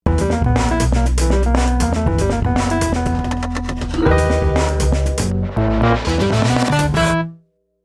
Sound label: Stinger; The Music Bed